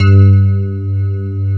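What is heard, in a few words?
Rhodes G2, right; East West - Phat & Phunky CD2; 90's Sample CDs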